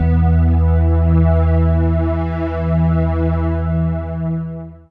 pad low string (E); Sonic Foundry (Sony Creative Software); Ugly Remnants VOL-2

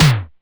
E Tom, DIGITAL KIT; Roland MV8800

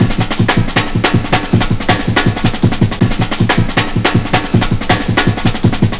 160, Drum Loops; Total Drum&Bass

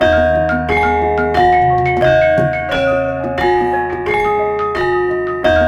GMLN, Gamelan Orch; Roland - LA Composer vol. 1

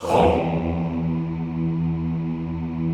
hommm, classical choir; Best Service

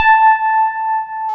PIA DX E-PIANOS

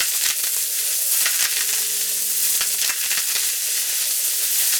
Cracklature

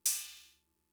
Cymbal, Fricke MFB-522, Drum Machines

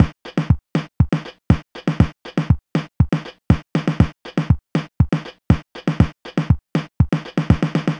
Apple II Elektro Beat, Drums, Chiptune; Sonic Foundry (Sony Creative Software)

